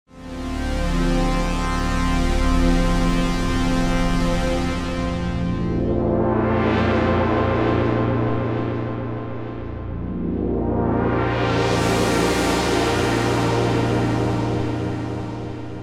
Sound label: The Sound of Manx Synths